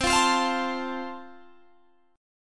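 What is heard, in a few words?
A Minor Sharp 5th, chord strum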